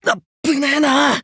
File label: get up; kurogane